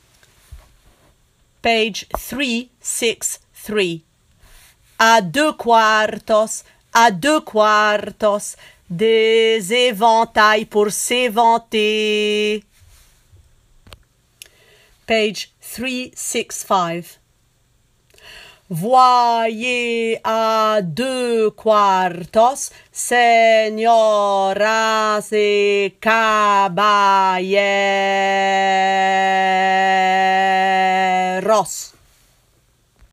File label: sopran; Carmen